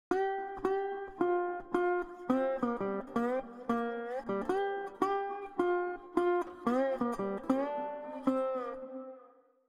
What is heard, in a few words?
slide guitar 8ths wide pingpong, colour delay; hofa plugins